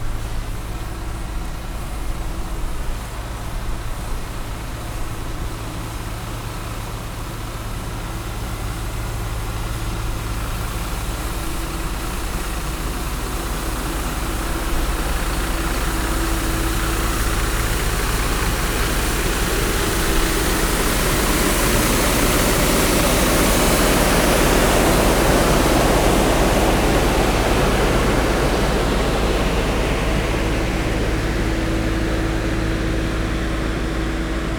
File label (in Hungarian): utcatakarítógép; arboc utca